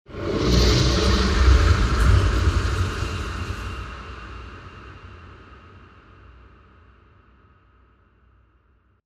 SFX HALLOWEEN MONSTER WHOOSH

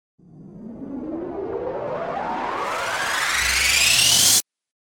RISER

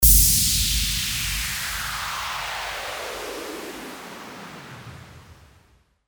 WHOOSH

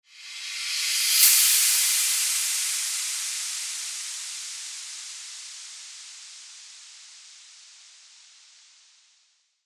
WHOOSH

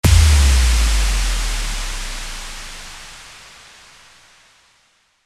IMPACT

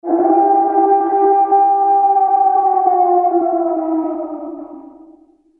SFX HALLOWEEN CREATURE HOWL

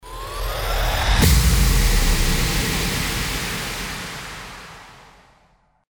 RISING IMPACT

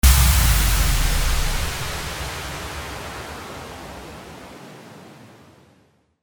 IMPACT

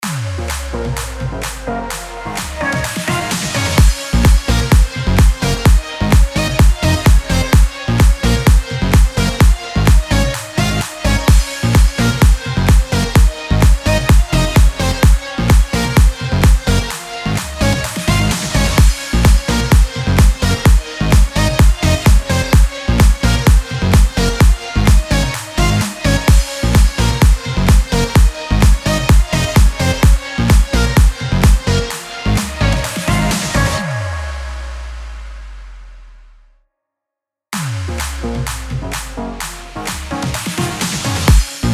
BED ELECTRO A#MIN 128BPM

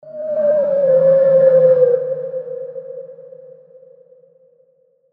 SFX HALLOWEEN RETRO GHOST WHOOSH

